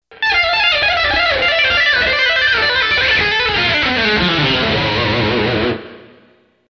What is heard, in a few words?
Lick